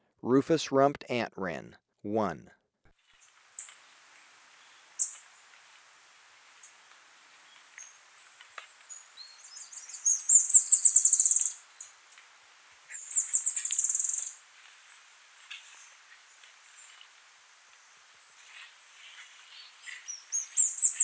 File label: Rufous-rumped Antwren; S. America